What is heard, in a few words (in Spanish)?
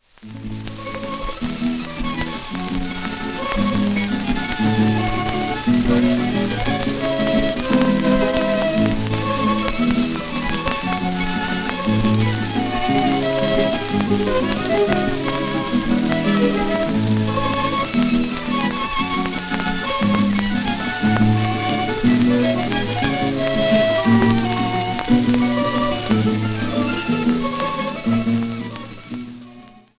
gitana